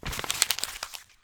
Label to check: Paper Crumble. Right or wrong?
Right